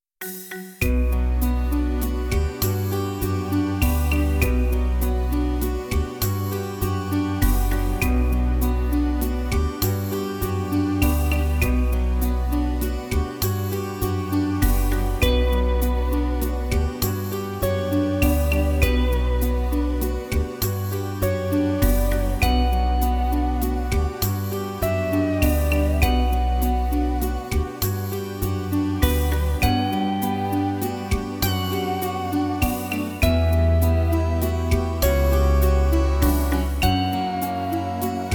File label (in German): Musik; Kinder; Cornelia Funke erzählt von Bücherfressern